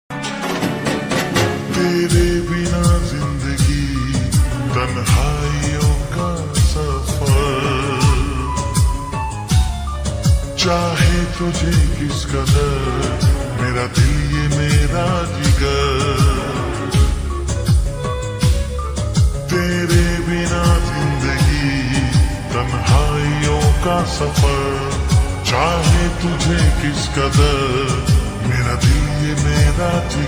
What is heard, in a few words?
Ringtone slowed and reverb